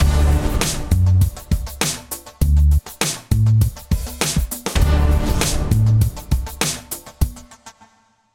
Signature Sound; FXHome; Signature Tracks

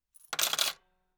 Money, Coins, Drop In Cash Register; Hzandbits

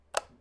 switch, UI Audio; Kenney's Sound Pack

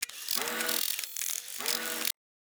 Robot Movement Mechanical Parts; MatiasMacSD; THE MACHINES 2 ROBOTIC SOUNDS

